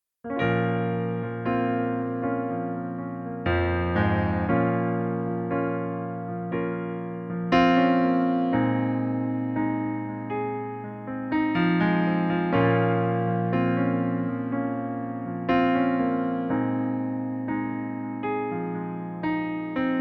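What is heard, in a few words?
piano playback Bb minor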